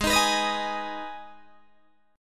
G sharp Minor G sharp down Guitar DADGAD, chord

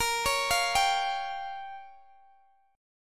Bb Minor 6th, Guitar, DADGAD; arpeggiated; x,x,20,18,20,17

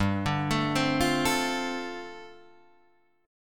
G 9th Suspended 4th, Guitar DADGAD

